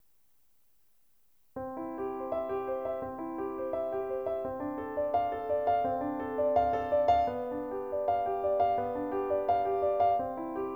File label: PRELUDE BACH